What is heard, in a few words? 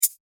cyber cursor